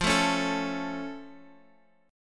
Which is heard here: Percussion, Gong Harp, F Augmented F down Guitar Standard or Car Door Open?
F Augmented F down Guitar Standard